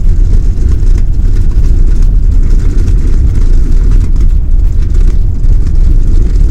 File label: Roll Cockpit; DCS